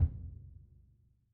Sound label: Bass Drum hit; Membranophones; Struck Membranophones; Bass Drum 1